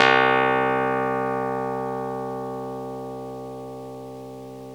CLAVINET C2; Big Fish Audio - First Call Vintage Keyboards

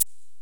Korg x5, Drums

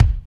KICK, Tekno Industrial; East West

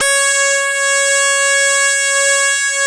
SYN Ana Lead; Best Service Gigapack 1

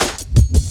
New Wave Cut 1, Drum Loops, 167.5; Zero-G Jungle Warfare 1, 2 & 3; 90 s Sample CDs

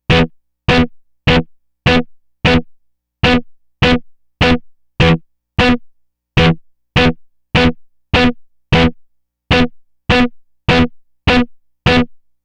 Disto Guitar, Guitar Synths

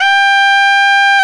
SAX TENOR, Roland Super Sax; Roland LCDP07; Tenor V-sw; Tenor 3way

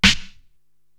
SD Old Ambi, Korg TR-Rack drum machine

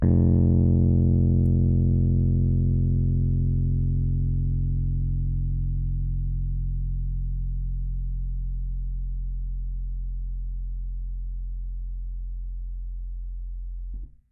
F1 MID, Rhodes Mark II; CEPCo Sample Series Vol. 3

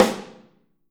Snare OH; Snare OH All; Drums Percussion

